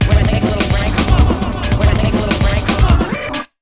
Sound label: RUN 140, Big Beat Drumloops